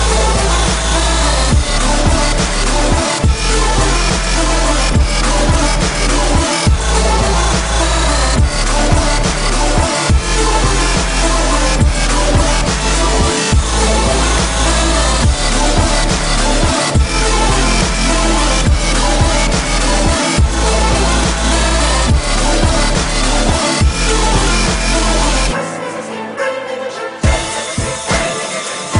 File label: electronica xmas mix